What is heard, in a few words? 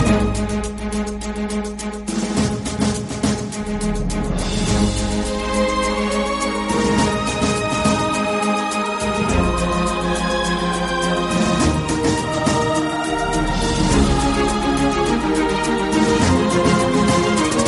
english movie musical tone ringtone